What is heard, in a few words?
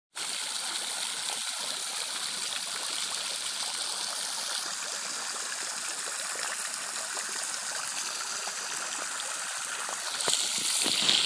fountain chateau villandry